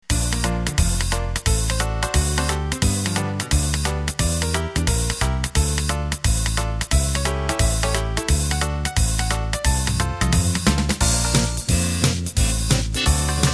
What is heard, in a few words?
Flapper